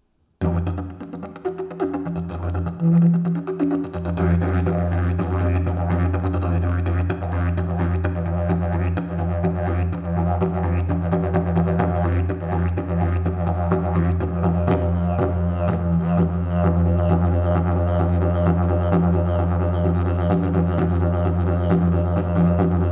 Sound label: didgeridoo